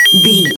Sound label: retro collect pickup coin